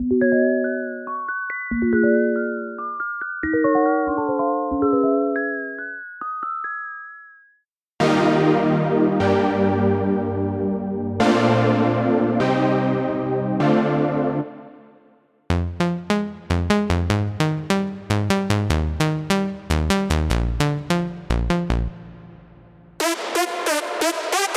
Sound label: Future Bass Melodic Trap